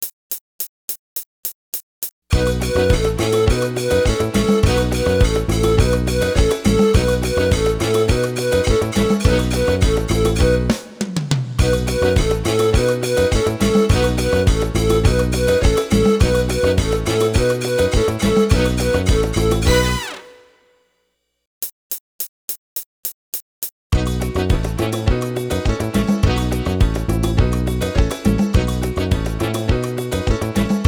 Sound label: south american rhythm